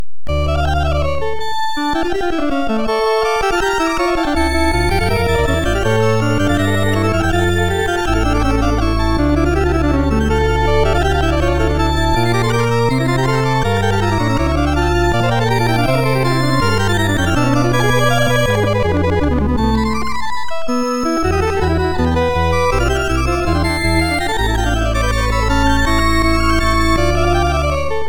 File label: BGM (Faster); Block Block; arcade